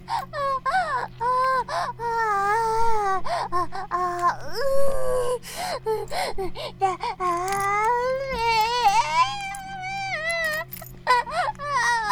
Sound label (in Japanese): バイブ放置, ASMR